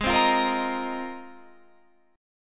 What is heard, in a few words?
G sharp 6th Add 9th G sharp Guitar Drop D